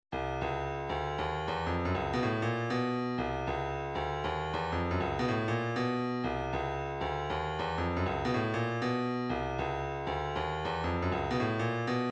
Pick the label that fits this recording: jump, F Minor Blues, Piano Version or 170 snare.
170 snare